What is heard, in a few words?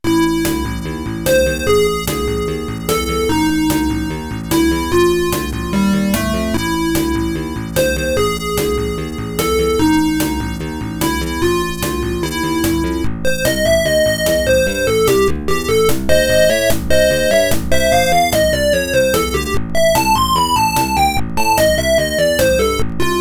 melee; first queen pc 98